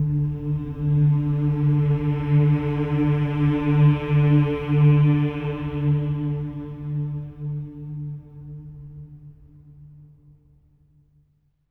MM D3; SWELL MMS

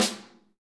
SN AMBIENT, Real Mega Drums; Best Service; Real Mega Drums VOL-1

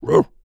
DOG BARK, VOCAL FX; Spectrasonics Vocal Planet; Jazz FX

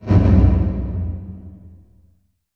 LH LT909, REVRB LARGE HALL; TR 909

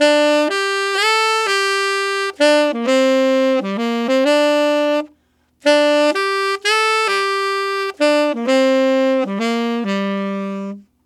Tenor Country Waltz in G min, Tenor Sax Loops; Sonic Foundry (Sony Creative Software); Jazz Solos and Sections

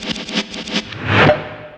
Fx Rev; Zero-G Jungle Warfare 1, 2 & 3; 90 s Sample CDs

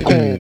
SLIDE DN, FUNK CHORDS, Guitars

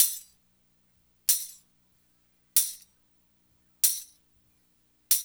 TAMB; East West

